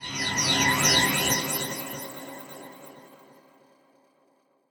horror creepy sweetener

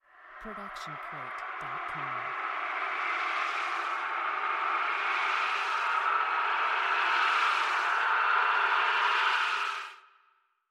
spooky wind rise; soundscrate

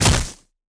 body impact ground

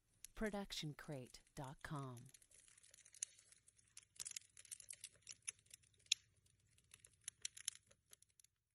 Outdoor Fire; SoundsCrate

